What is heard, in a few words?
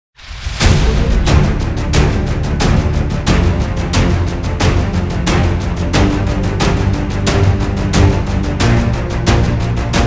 action trailer